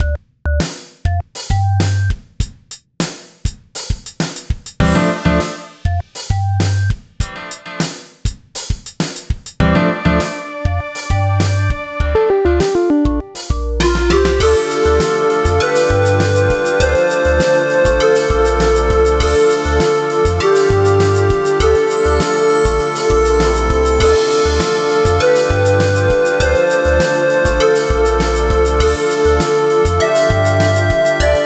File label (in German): Telefonwarteschleife, Telefon; MOR-Tonstudio